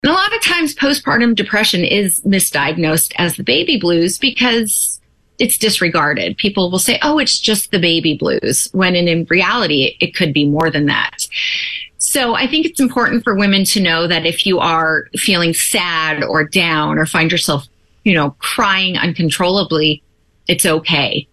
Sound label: blues